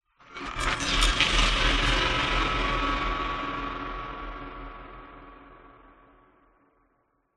cinematic tone drone bright electric; sound design

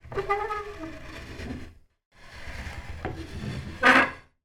foley wood rail turn squeak; zapsplat

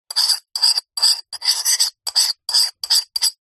household spoon scrape in bowl

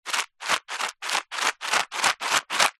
food sunflower seeds pack shake

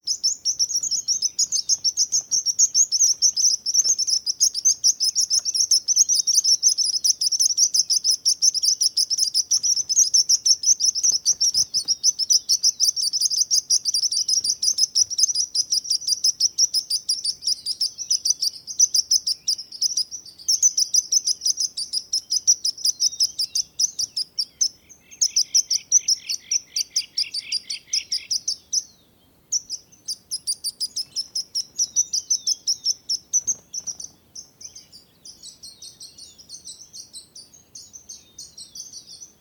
bird sparrow singing flying; animals; felix blume

